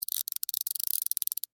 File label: Wind Up Toy Click, Clicks; Foley; Winding; Sound Effects:Objects; BlastWave